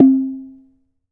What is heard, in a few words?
CLAY, Cameroon